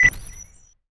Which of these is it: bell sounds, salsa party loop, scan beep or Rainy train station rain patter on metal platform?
scan beep